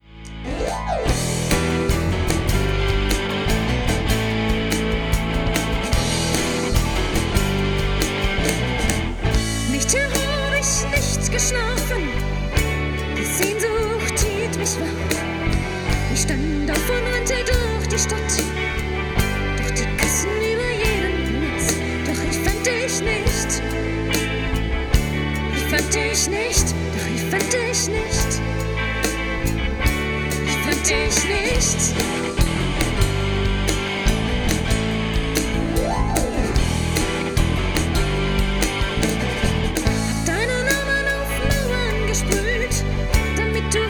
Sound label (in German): Hohelied